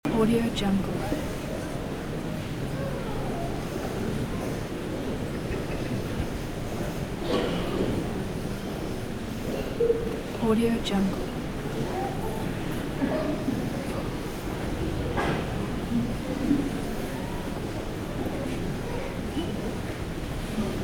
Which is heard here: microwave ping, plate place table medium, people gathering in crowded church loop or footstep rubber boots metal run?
people gathering in crowded church loop